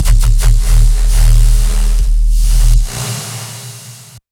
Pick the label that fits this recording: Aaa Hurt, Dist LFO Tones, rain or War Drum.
Dist LFO Tones